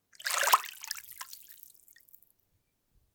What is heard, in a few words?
Shallow Water Movement; children of morta; Home